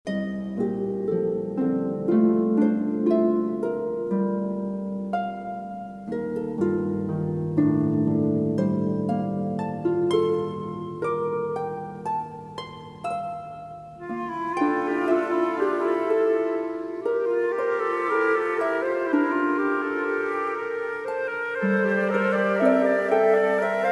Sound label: music box of light; CHAMBER WORKS